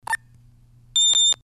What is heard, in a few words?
beep